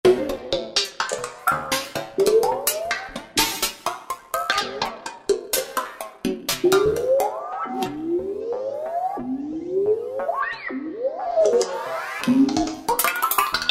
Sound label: Machine Seq, Roland V-Synth; Factory Patches